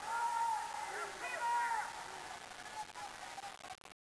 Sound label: dist shout